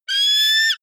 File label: offspring eagle call, voegel